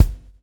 SWNG

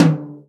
tom, Real Drum Kit, jungle kit

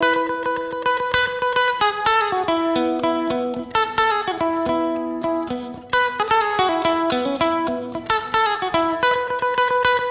TWNSHIP, S.AFR.GTR; Spectrasonics; Heart of Africa 2

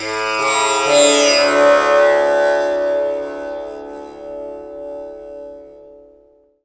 TAMPURA; Spectrasonics; Heart of Asia

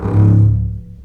String Collection, MARCATO

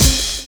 PULSE; Univers Sons

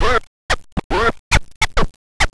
Scratch; Sonic Foundry; Hip-Hop Street Beats Construction Kit; Phat Trax

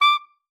D6, ALTOSAX; Xsample VOL-2

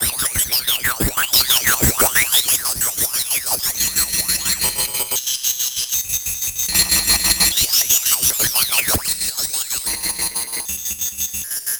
INSECT, Special FX Twisted; USB Soundscan; AKAI

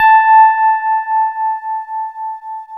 FM Rhodes; Club-50 - Foundations Roland

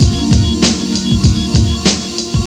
BEAT, Hip Hop; E-MU Formula 4000 Series Vol. 1; Hip Hop Nation; Beats 95-99bpm

